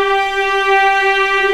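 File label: viola G4, ARCO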